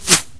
arrow; The Elder Scrolls III - Morrowind; Morrowind GOTY GOG

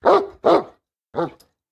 Big dog Mastiff; SyrinScape; Missing Magistrate

